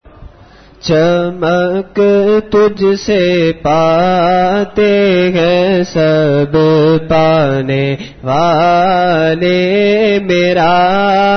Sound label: ashura naat; muharram 1436